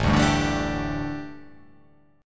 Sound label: A 7th Suspended 4th, A, down